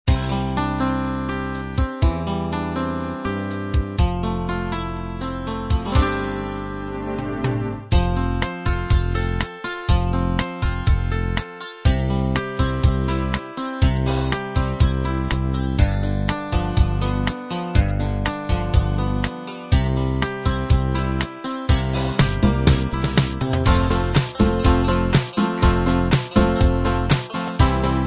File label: pop beat combined